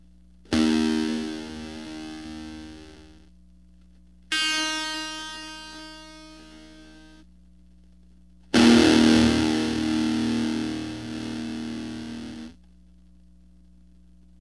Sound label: distort, guitar; msp430f169 guitar